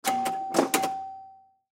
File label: Pinball Bumper, Pinball Bumper Hit Ball Ding; Backgrounds; Arcades; Sports; Blastwave